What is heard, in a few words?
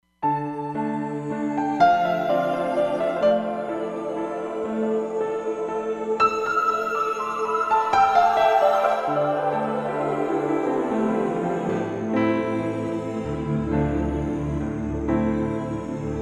Cicada Piano, Roland Fantom XR